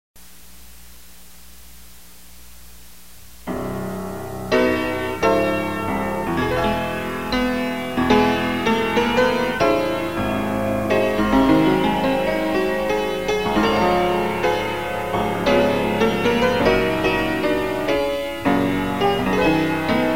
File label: PIANO